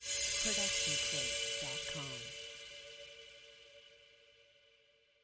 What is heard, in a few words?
Shimmer Hit; SoundsCrate